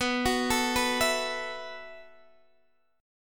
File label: B 7th Suspended 4th B down Guitar Open D